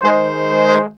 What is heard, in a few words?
brass G#, vocal house; PS-28 Vocal House Samples